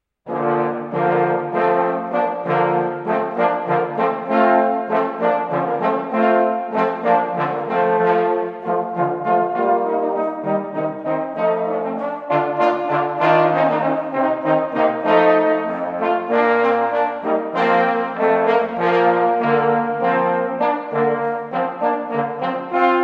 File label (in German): Posaunentrio; Dresden; Wassermusik